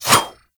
Dagger Whoosh; Warframe; Dual Daggers

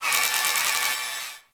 Rolo Dex Swipe; Ambience; Props; Corpus Ship

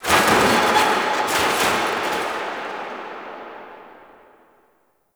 Stadium Metal Crash; Warframe; Ambience; Nineteen Ninety Nine

